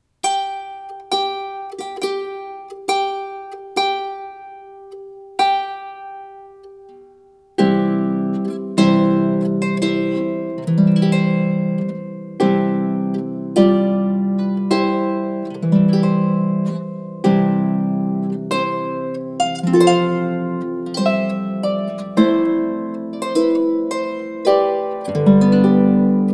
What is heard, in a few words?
harp; solo